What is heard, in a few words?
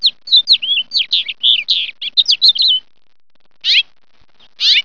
house finch, songs